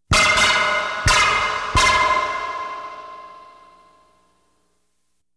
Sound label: Science Fiction effects, Lasers, Light beams; Valentino Production Sound Effects Library CD50